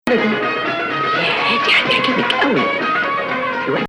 Rast, maqam

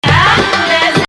Nahawand, maqam

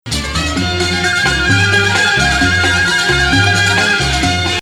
Nahawand, maqam; Rast

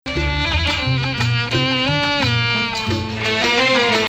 Bayati, maqam

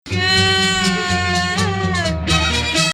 Hijaz, maqam; Rast

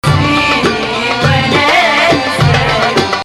Rast, maqam

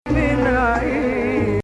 Nakriz, maqam; Rast

